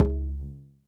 Udu Color

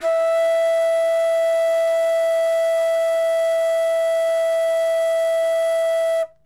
Wodden Flute E3

